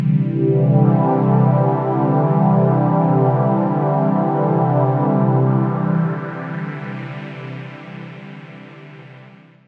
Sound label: gentle major oberheim pad; Pads